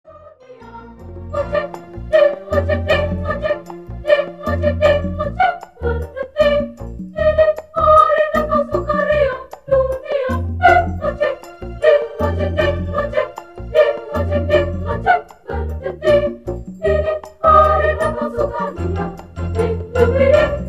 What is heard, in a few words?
jingle bells on 5, drummers